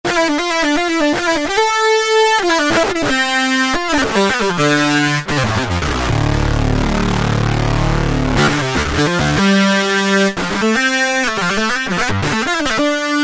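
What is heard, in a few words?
Kurzweil K2000, ELECTRIC GUITAR; Kurzweil K2000 Contemporary ROM; Contemporary ROM Programs and Demos diskette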